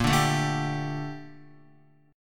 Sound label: Bb Major 13th Bb down Guitar; 4F,4A,5C,5G,5C,6E; strummed